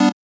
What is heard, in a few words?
Chord